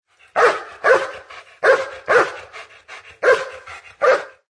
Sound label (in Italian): suono cane